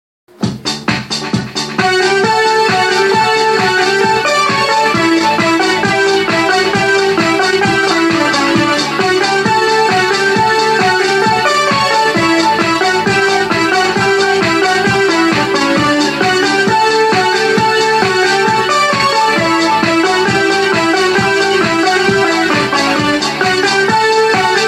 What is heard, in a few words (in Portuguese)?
vinheta sem voz; so colocar a voz